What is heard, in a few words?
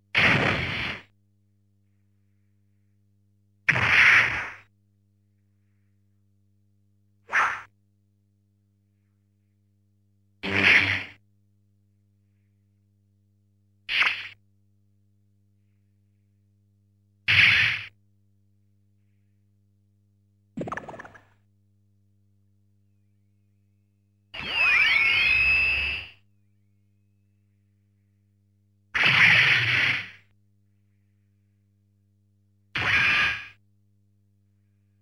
Enemy Fire Sound SE